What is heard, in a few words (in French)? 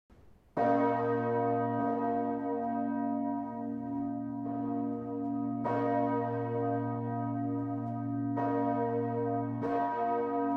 cloche petit bourdon sib